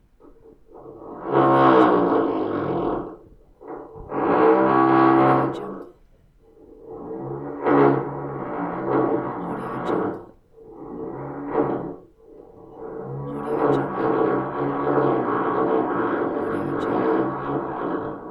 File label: neighbor drilling; urban sounds